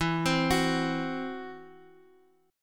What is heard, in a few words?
E Suspended 2nd Sharp 5th, Guitar, Open F; Esus2#5 arpeggiated; x,11,12,13,x,x